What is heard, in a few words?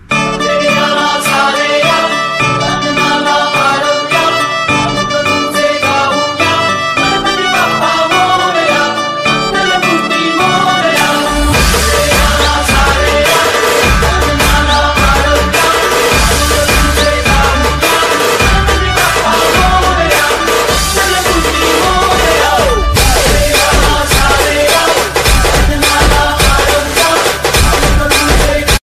Ganpati Bappa Morya Ringtone; god ganesh